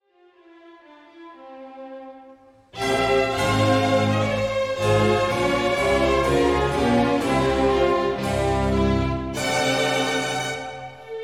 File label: Concerto Grosso In C minor Allemande; Handel; Concerto Grosso 8; Op. 6 8; HWV 326